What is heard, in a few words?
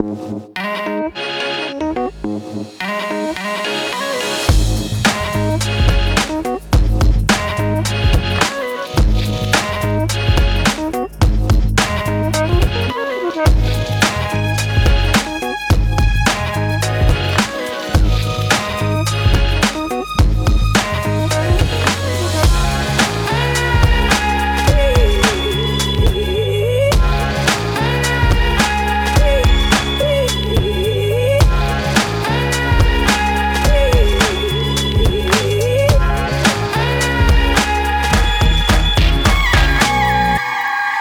Summer Hip Hop